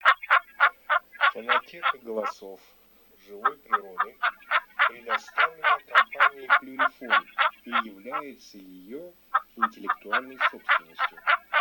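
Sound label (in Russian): Глухарь Gallo Cedrone, манок; plurifon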